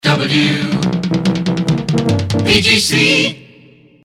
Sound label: jingles, resings ascending efx; pams series 42a